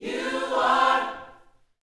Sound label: Vocal, Gospel, PHRASE; Spectrasonics